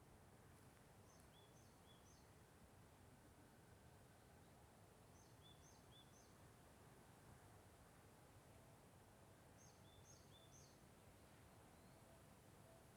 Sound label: Stereo, walk; Fild recrding walk